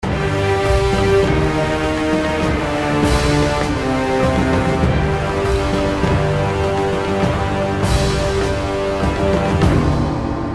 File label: lose; radiant